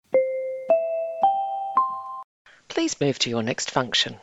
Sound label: four bright chimes please move to your next function